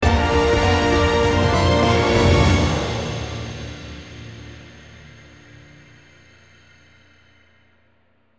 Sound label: Combat Final Battle Stinger; Skylanders Giants; 3DS PS3 Wii Wii U Windows Xbox 360 gamerip 2012